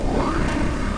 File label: jet; hcombat